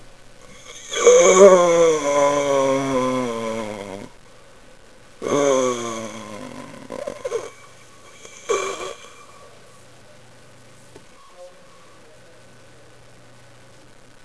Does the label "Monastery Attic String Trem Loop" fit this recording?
No